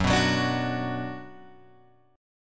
Gb Suspended 4th Sharp 5th Gb, down, Guitar, Open G